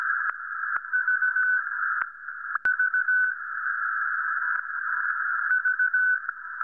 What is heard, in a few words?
7027 beacon; iarums